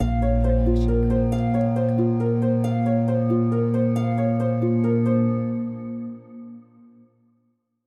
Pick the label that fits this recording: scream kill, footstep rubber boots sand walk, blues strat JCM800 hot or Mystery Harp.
Mystery Harp